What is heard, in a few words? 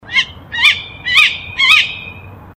kewick